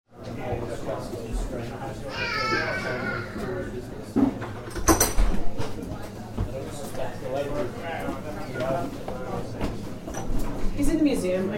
corridor between pub proper and museum in the square and compass inn